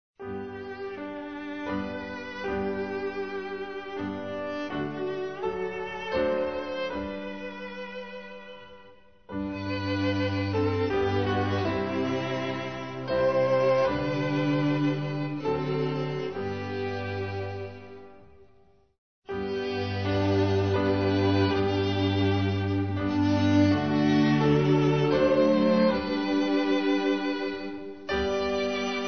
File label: gently raise the sacred strain instrumental; hymns portuguese